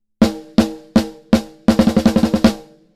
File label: SplitEQ snare TONAL mid high cut